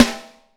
Vintage Snare Drum; Q Up Arts; Johnny.C's Vintage Snare Drum; 4X14 JCSM

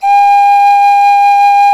pan G 5, panflute; SoundBytes - Early Patches

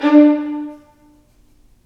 STR Vlns Marc; L-CD702; Vlns Marc1-3

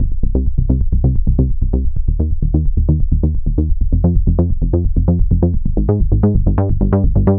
Bass Line; Eclectic Electronica

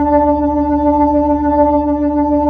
B-3

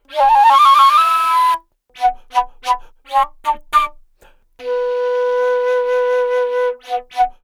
Wood Flute, Asia; Sonic Foundry (Sony Creative Software); Continental Drift Aftershocks

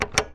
MECH ON; AKAI Anime Sound FX

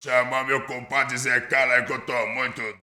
BRAZIL, World Voices

